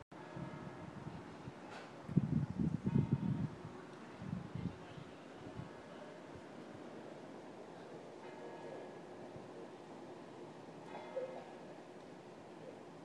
night time St Ives, bells peeling in the distance, street; downalong; bells of st eia; street noise